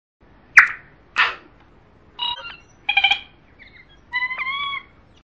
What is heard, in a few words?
tui